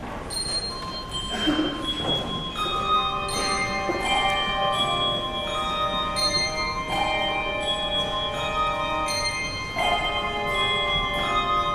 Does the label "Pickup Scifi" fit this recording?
No